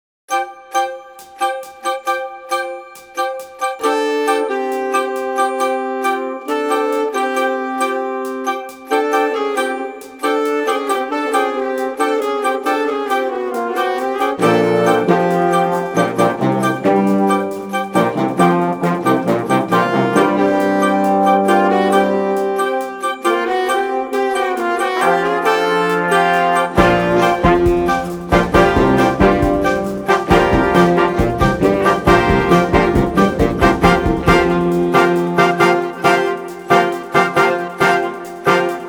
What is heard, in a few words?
Wind Ensemble; WHS VIRTUAL Wind Ensemble